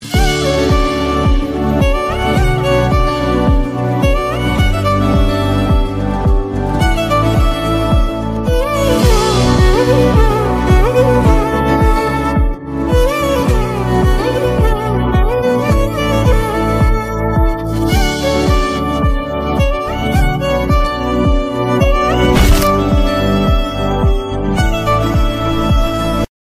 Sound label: Violin Ringtone